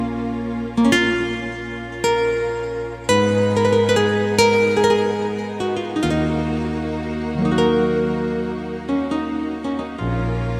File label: Guitar